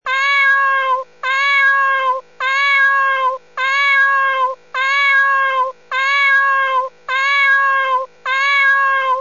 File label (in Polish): Miauczący Kotek